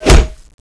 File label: axe hit; oblivion darkangels